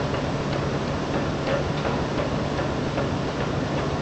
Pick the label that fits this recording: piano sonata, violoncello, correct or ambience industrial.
ambience industrial